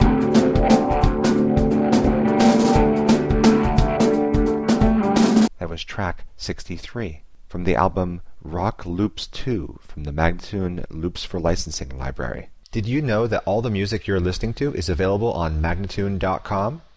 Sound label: 087 D spoken, Rock Loops; spinecar; Loops For Licensing; MagnaLoops